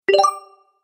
Health Boost, video game

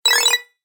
Game Collect Your Coins, Video Game Sound Effects

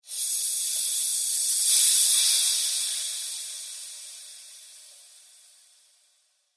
Sci Fi Air Burst Expel Pneumatic Hose Discharge; Doors

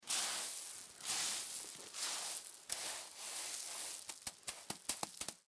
Sand Movement; Sand Hand Sandcastle; Playground; Backgrounds; Foley; Playgrounds; Blastwave

